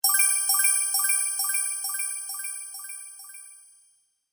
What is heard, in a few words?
Fairy Sparkle Delay, magic; fiction sound effects